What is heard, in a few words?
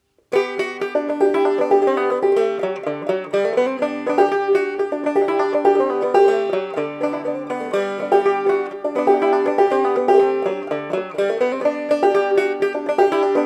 Banjo G